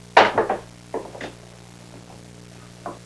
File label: BILLIARD; Sports